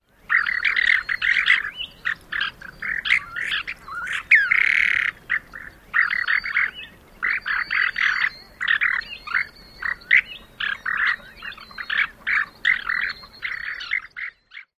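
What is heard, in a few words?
SNOPLO quirr and other calls, snowy plover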